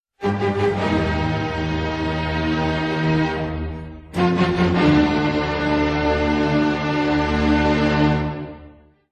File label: Symphony 5 1st movement Unison